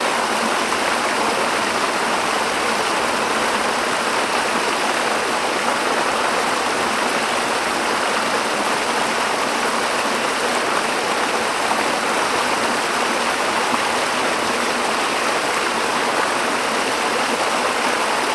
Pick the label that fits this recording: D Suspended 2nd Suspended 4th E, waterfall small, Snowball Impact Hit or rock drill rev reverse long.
waterfall small